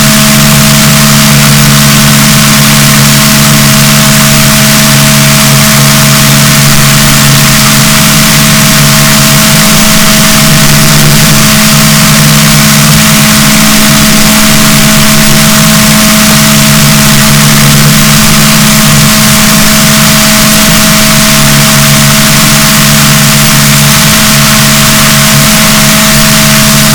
rorquals positif, BalaenopteraPhysalus, boat; SNR 12dB

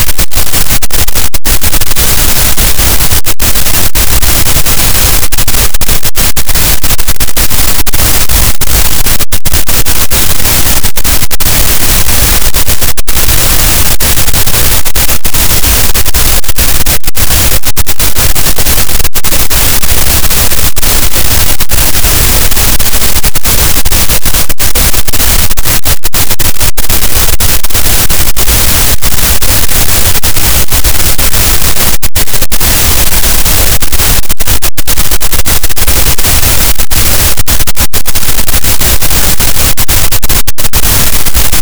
cachalot, Physeter Macrocephalus, gaussian noise

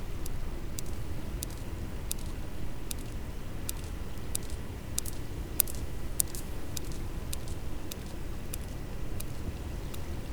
cachalot positif, Physeter Macrocephalus

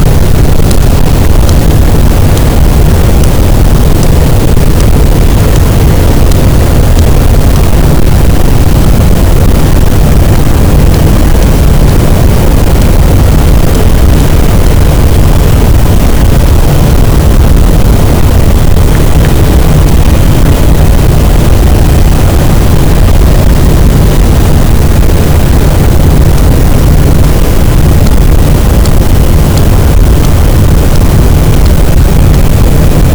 cachalot, Physeter Macrocephalus, gaussian noise

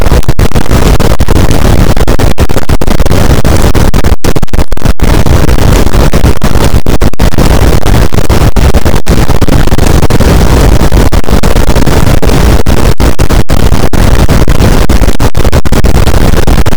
cachalot positif, boat; Physeter Macrocephalus

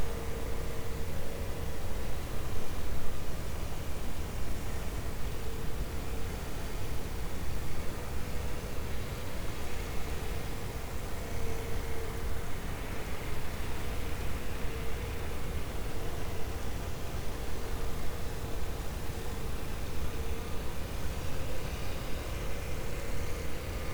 cachalot negatif, Physeter Macrocephalus

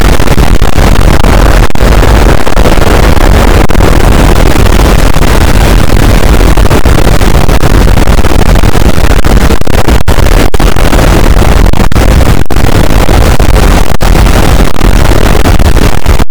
cachalot negatif, boat, Physeter Macrocephalus

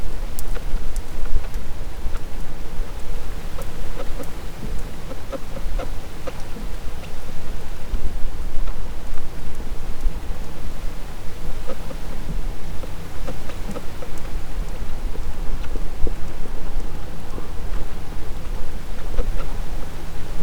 cachalot positif, Physeter Macrocephalus

